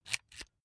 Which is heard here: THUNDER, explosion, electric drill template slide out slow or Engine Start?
electric drill template slide out slow